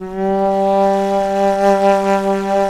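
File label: Japanese FLUTE G3; Soundscan vol.60; Asian Traditions; AKAI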